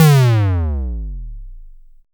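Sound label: SYNTOM LO F, analog toms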